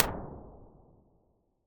MEDIUM DAMPING CAVE, impulse responses

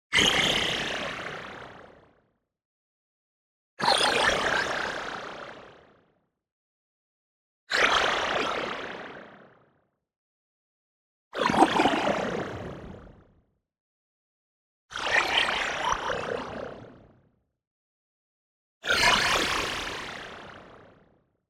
MOVEMENT FAST Screaming Shifter; boom library; Anime Essentials; DSGNMisc

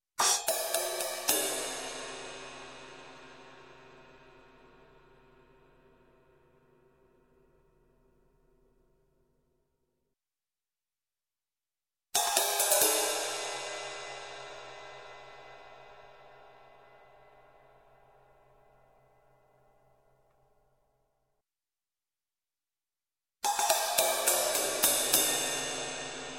Cymbals bridge, Music, Percussion; Sound Ideas; Series 2000

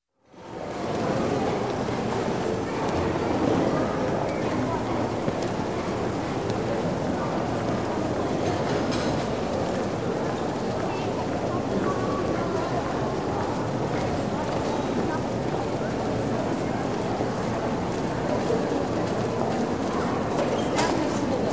Shopping Mall Medium, Indoor General Ambience; Store; Sound Ideas 2000 Series

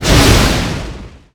Rocket Launcher Shot; SFX Kit; Weapons

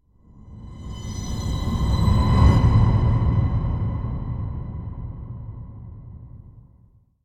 Whoosh Tone By Heavy; Hollywood Edge; sound designer's toolkit v2

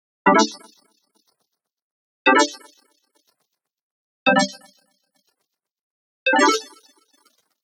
Arcade Click Negative

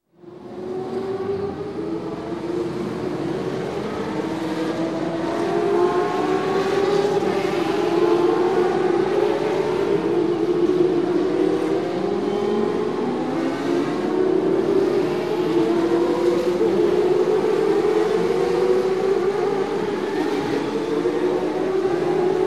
Indy Style Race; Sound Ideas; Series 2000

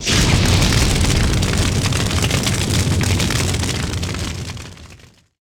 Fire Ignite Large; Destruction

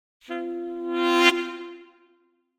Horn Section Criminal Increasing - Light; Hollywood Edge; sound designer's toolkit v2

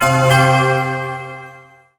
Pickup Magic; SFX Kit; Pickups

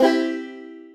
PUZZLE Success Banjo Three Note Fast Wet; stereo; Universal Sound FX

